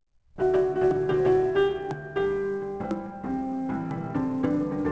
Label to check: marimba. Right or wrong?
Right